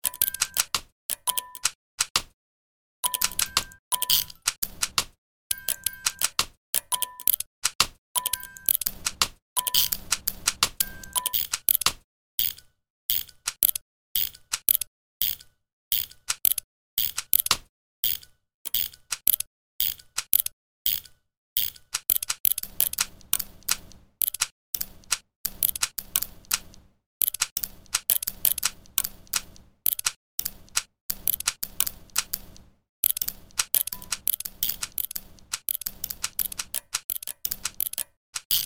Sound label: Music Box Kit; Noiiz